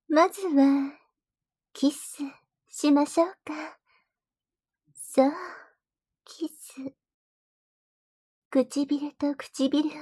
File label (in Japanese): キスと耳舐め; 淫魔の吐息 ～負けたら性奴隷!？あなたとサキュバスのエッチな戦い♪～